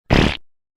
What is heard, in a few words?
Fart Notification Sound